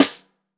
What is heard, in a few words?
Snare Bottom